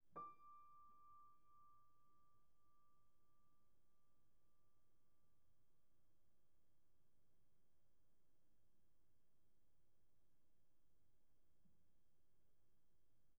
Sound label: Piano pp D6